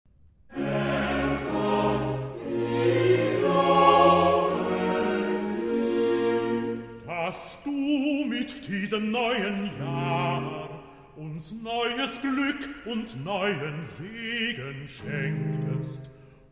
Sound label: Singet dem Herrn ein neues Lied, Choral e Recitativo Coro, Herr Gott dich loben wir; BWV 190